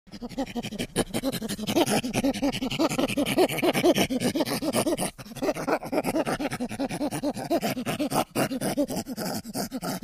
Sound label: Chimpanzee Grunts Lo, chimpanzee